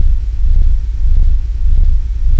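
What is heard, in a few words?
rumble; duke nukem total meltdown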